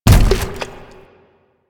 Gas Tank Impact; Warframe; Nineteeen Ninety Nine; PvPvE

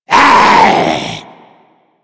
Zombie Attack, Vocals; Mariner Zombie

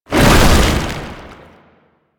Tank Infested Melee Swing; Enemies; Abilities; Warframe; Lasrian

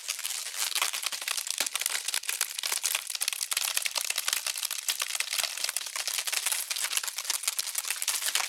Scorch Mark Loop; Warframe; Sacrifice; Courtyard

